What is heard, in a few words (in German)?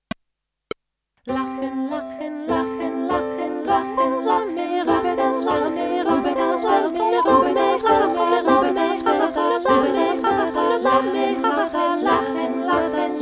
lachen; musica in classe